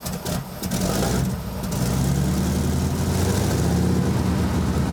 start engine, engines; World of Tanks

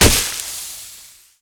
bag flour; Explosions

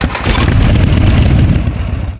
zodiac boat startup

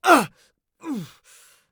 hit; Escape from Tarkov; scav3

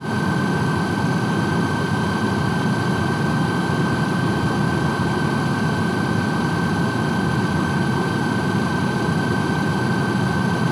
ship engines; World Of Warships

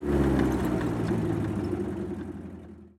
engines; World of Tanks

